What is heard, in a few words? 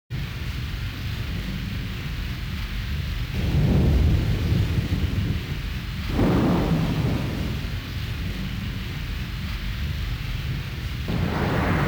Stage Ambient Music; The Last Blade; Shikyo